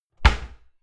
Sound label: cupboard close dishes ringing hard